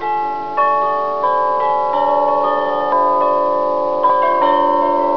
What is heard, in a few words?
chimes